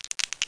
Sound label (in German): Käfer; badmojo